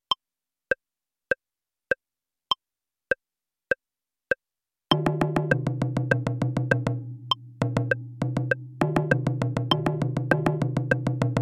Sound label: hocket 100bpm, taiko